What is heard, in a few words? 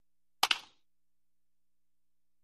Baseball Bat Hits Woo; baseball fx & games